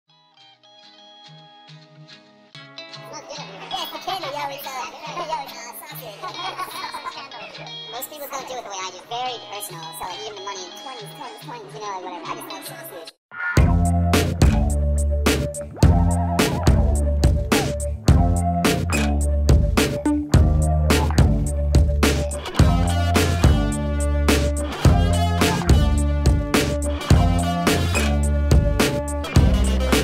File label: sped up